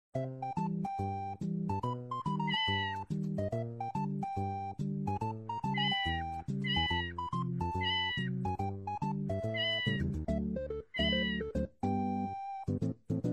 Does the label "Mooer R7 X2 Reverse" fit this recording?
No